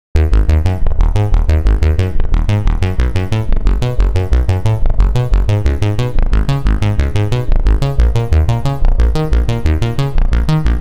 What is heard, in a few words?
arp; the space soundset